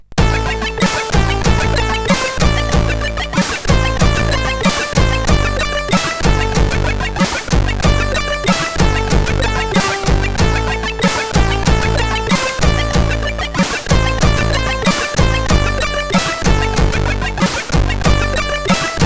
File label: Lullaby, sid CSG8580R5